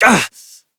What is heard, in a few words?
Pain Short, Adult; New War; Adult Operator